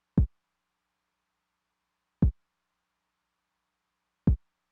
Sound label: kick drum w accent, Roland TR-909